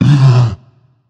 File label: nv breath, vox; smite; voicepack; vulcan